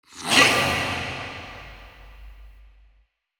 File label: Processed Battle Shouts, Viking Vocal